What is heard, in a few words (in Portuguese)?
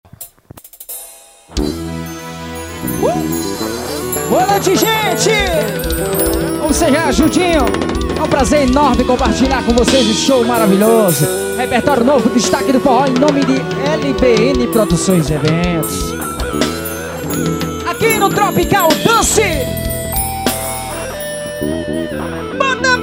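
forró, tropical dance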